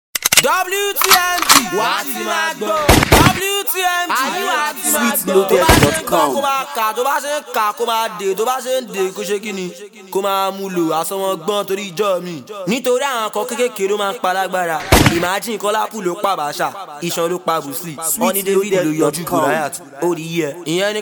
Free Jingle

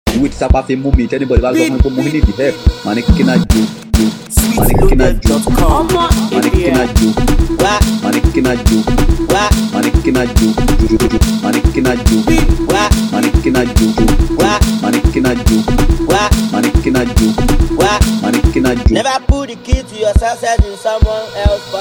Cruise Beat

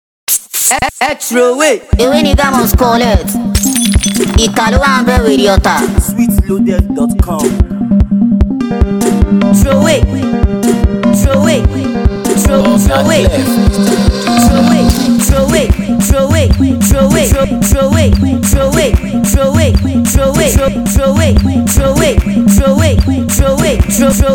Free Beat

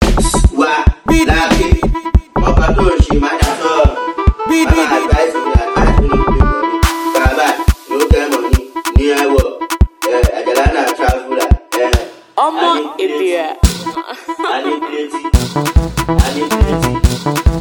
Cruise Beat

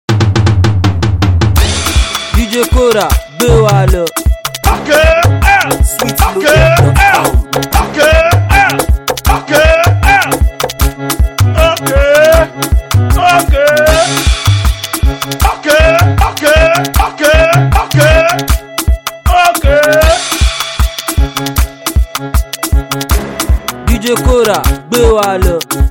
Okay Beat

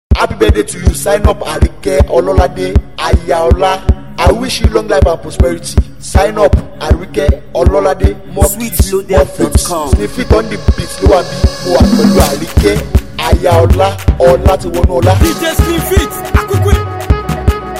Beat

